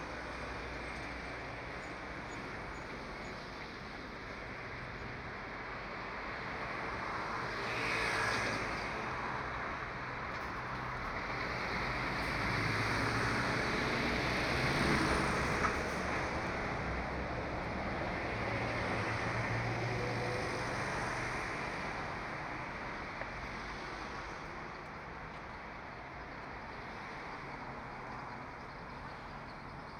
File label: busy street; IEEE AASP; scenes